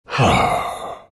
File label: drag happy; dota